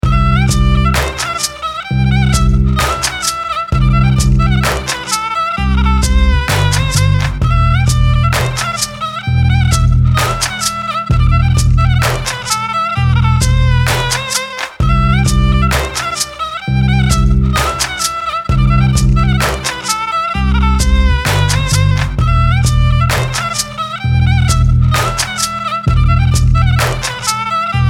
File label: Oriental Funk; Fusion World Beats